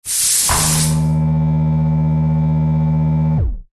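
science fiction door open hiss air release then auto motor drone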